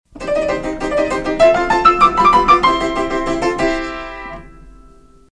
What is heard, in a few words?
Rinky Tink Piano Chase; PAMS; KOIL